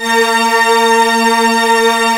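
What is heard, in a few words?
D#5, full orchestra; East West; Global Sounds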